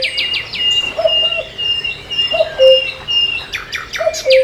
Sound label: CUCKOO; RallyC; CD-Gamer 2000-05